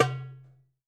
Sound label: Doumbek - C; percussion; Cymatics - Infinity Beta Pack 2.0; Zeus Percussion Collection